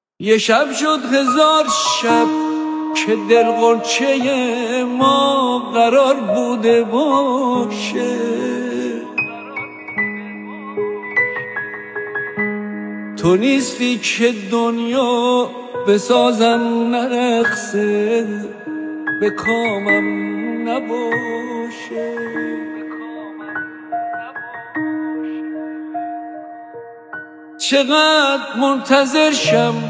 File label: Piano Version; نسخه پیانو